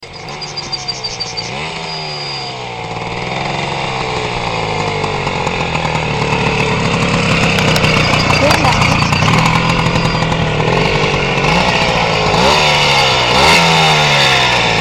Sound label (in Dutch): NSU geluid